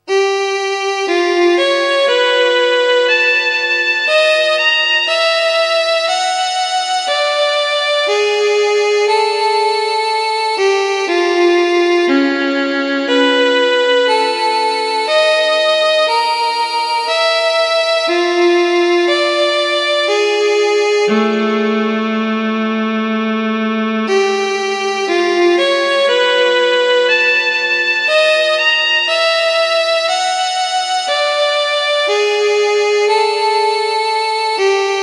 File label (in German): solosonate fuer violine largo; 3 satz